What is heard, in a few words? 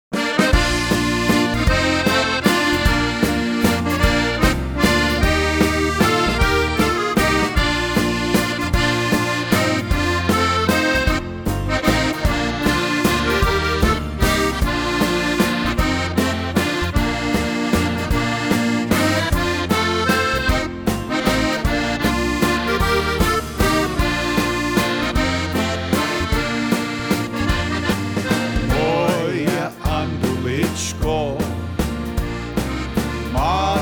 Waltz